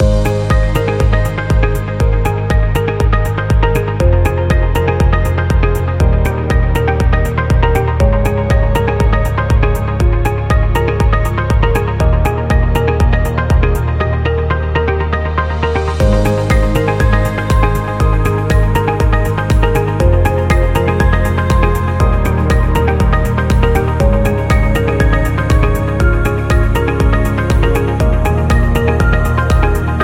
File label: Corporate Upbeat Loop Motivational